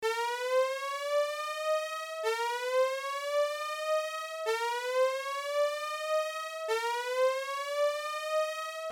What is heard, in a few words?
Sci fi alarm siren loopable